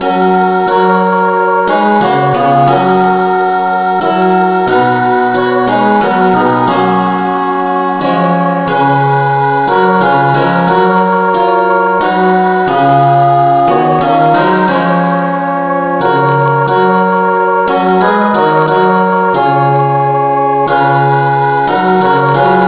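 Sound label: no vocal key F sharp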